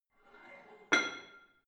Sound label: barbell plate slide in hit ring soft, Fitnessstudio; sport freizeit